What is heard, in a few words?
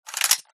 switch; resurviv